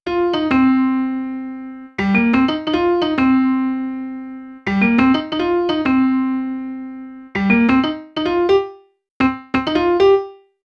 Melody Main; Cinematic Funk; Sonic Foundry (Sony Creative Software); Urban Tone Poems

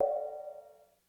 Ride, Korg DSS-1 Drums

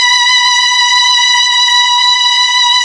STR Mellotron B4, STRING; Best Service Gigapack 1

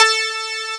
SYNTHESIZER DIGI DECAY

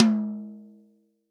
TOM, Real Drum Kit, POP KIT

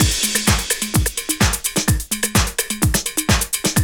loop, 125; Sound & Vision - Gigapack I

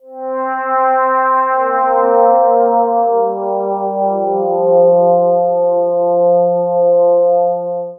Evening String; Strings & Pads; Sonic Foundry (Sony Creative Software); The Electronic Music Manuscript Collection

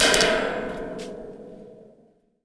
Thunder Drum

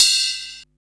Ride set, Drum and Bass; Ride Set 1; Instrument Samples

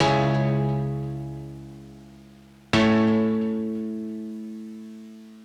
Taped 88BPM C# min, pianos; 99Sounds; Monosounds; Music Loops; Instrument Loops

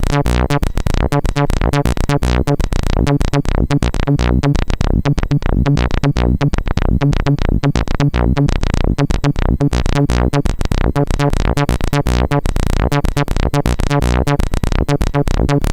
C64 Acid Synth, 8-Bit chiptune

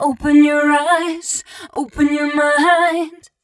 f mel d, fem Vocals; Mutekki Media; Diamond Vocals 2

